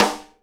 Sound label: SNARE RIM, Live Snares